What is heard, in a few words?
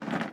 Sittable Plastic Chair Sit